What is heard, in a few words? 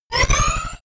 sci-fi laser shot disrupt